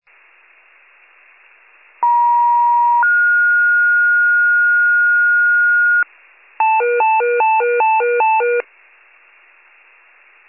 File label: FIRE pager alert